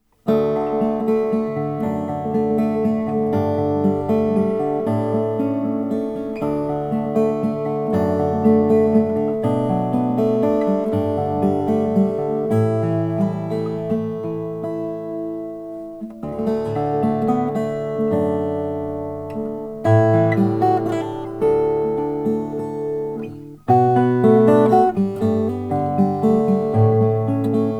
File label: lowden s35c 12 fret guatemalan rosewood